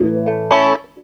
CLAV GEL; USB Soundscan vol.32; Roots Disco; AKAI

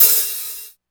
HH, Korg Electribe EMX-1

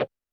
percussion, Roland TD-20

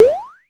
EFX, SFX KIT; Wizoo - Platinum 24 Electronic

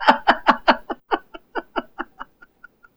Demented Laughter

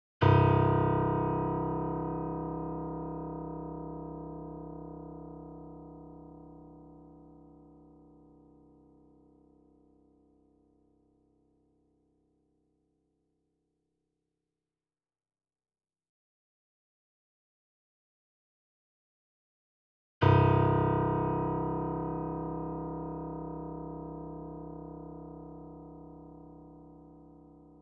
C1 E1 A1 Grand piano; KORG; Sound Sampling Collection VOL-3; Grand Piano 88

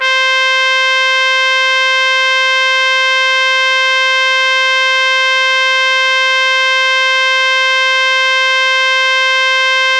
Trp, Yamaha DTXpress

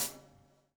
SLICK, Backbeat

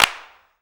CLAPS, SINGLE CLAPS; Best Service; World Colours

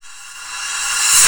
Reverse

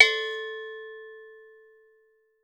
ENGL CONI, AGOGO BELLS; Voice Crystal - Steve Reid Definitive Percussion

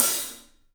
PAH14, 3D Drums, LITE PAIST H; 3D Sound

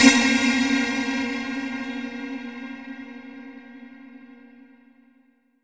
Anthem Stab; Big Fish Audio; OSH Chords

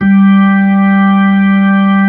B-3

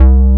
PULSE BASS; SAMPLE WAVE MIXING 1

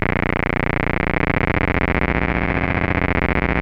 2600 C1, ARP 2600 Synthesizer; Hollywood Edge - Giorgio Moroder Rare Synthesizer Collection

